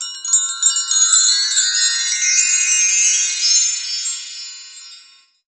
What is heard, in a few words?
Windchimes Up